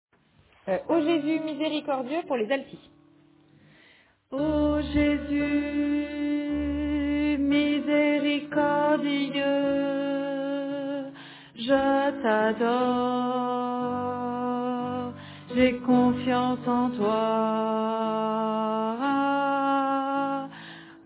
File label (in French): Alto